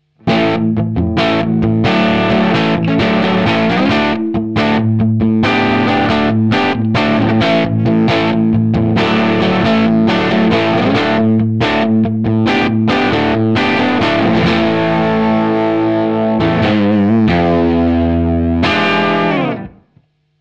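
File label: Squier Crunch Bridge Pickup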